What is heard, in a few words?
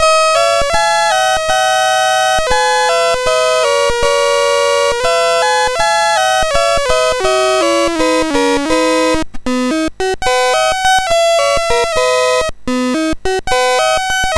Klarinettenkonzert No 3, sid MOS6581R4; Stamitz